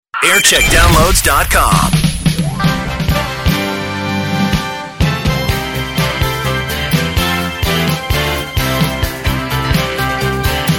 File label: jingle montage; classic gold